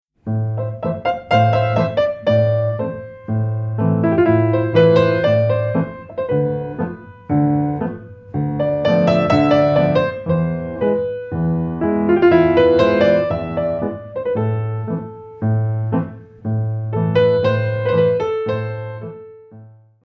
tango

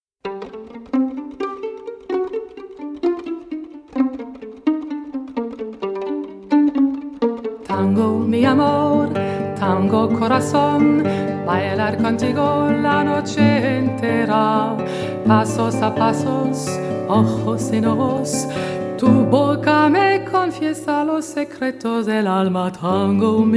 tango

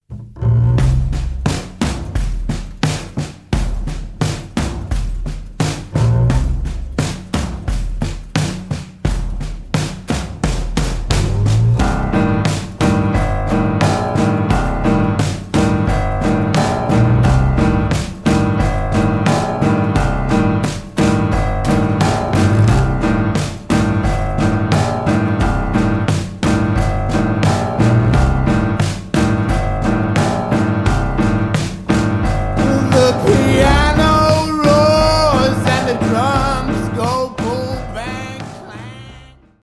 clap hands

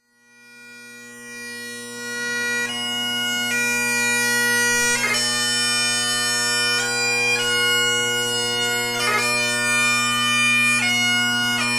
pibroch ground march strathspey reel; lochbroom

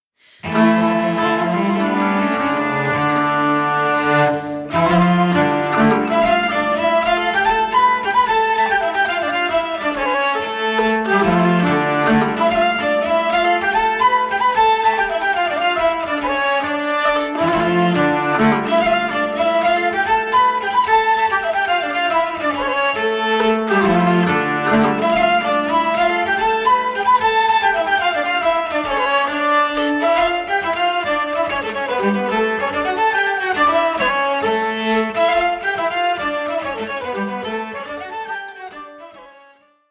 polska; polska efter troskari erik

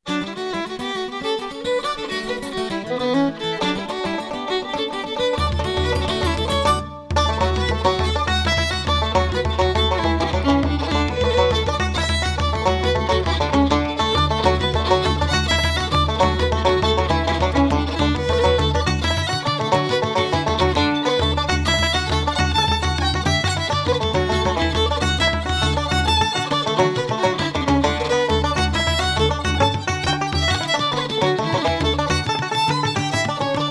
connemara jig set, irish traditional dance music

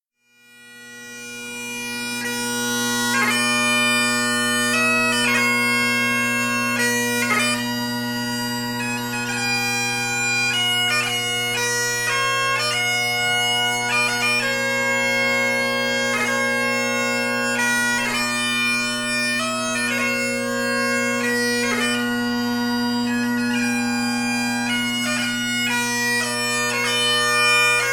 waltz strathspeys reels; lochbroom

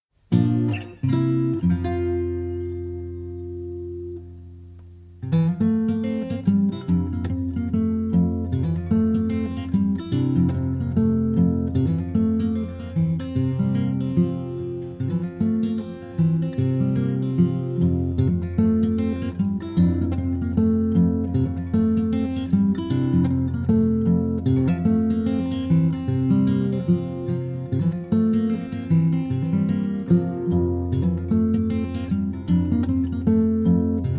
gavotte; gavotte pour francoise

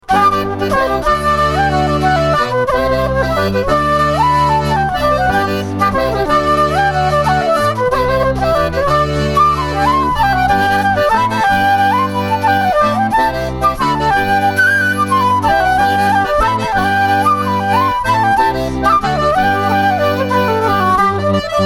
gavotte; la pourlet coquette gavotte

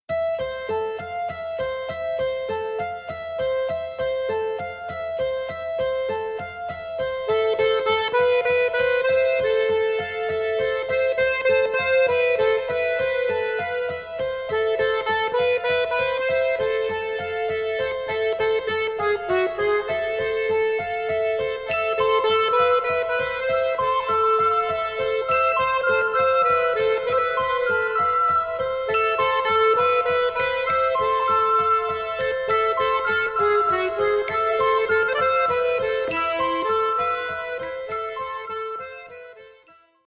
strumentale

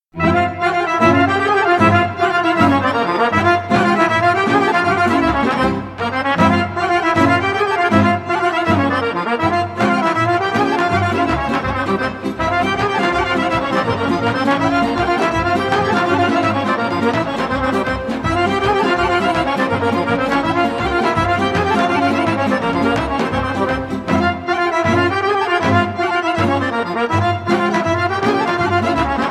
rupchensko horo, Bulgarian folk songs and dances

